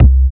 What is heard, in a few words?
Synth Bass Single Note; Basses